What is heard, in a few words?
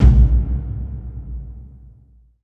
movie, impacts & hits; Techno Trance Essentials